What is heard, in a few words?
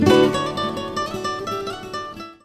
logo two mellow